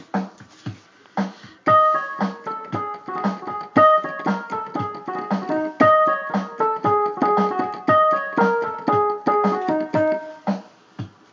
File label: riff down